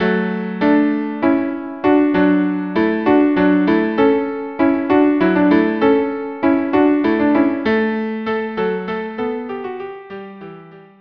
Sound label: Hornpipe cello duo; Water Music